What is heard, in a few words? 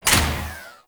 Ship Fighter Platform Stop; Corpus Ship